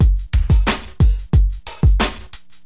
DM LOOP, HipHop, Drums; LiveAct Pool

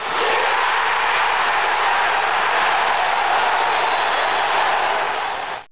CHEER; CHEERING

